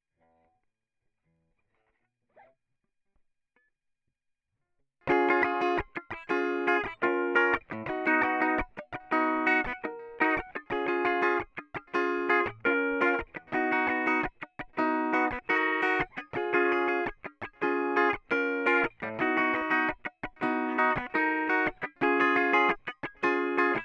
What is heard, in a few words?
strumming; logic